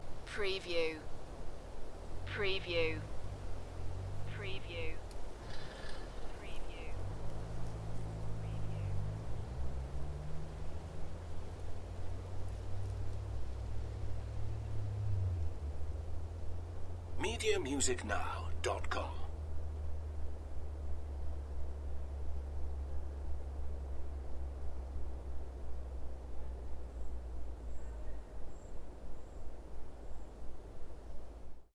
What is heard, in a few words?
Windy Welsh forest ambiance; beanstalk audio